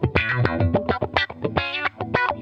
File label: Funk Guitar; 90 s Sample CDs; Zero G